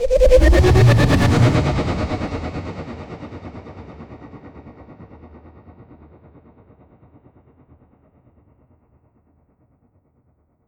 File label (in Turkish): jeneratör sesi; jenerator balatma